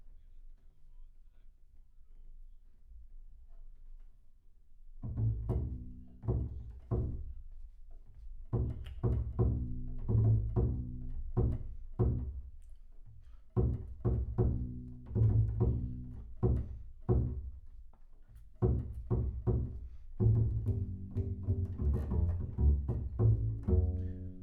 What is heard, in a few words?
Bass Room